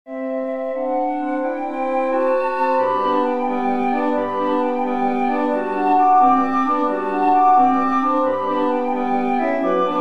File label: woodwind trio